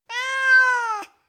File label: Tom Cat, Individual Meows; BBC Sound Effects Library